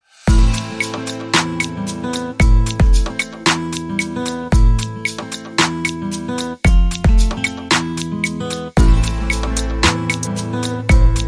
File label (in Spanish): Buena Guitarra